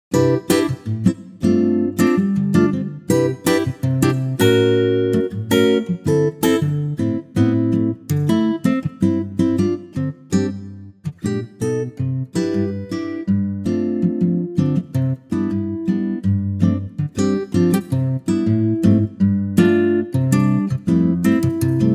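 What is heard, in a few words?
C acoustic guitar; key - C - vocal range - C to Eb (optional E top note); Latin acoustic guitar arrangement of this classic American standard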